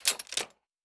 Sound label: Metallic Gear; Survival Game Sounds - Lite Edition